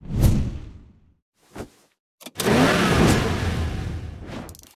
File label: Blaster; starbites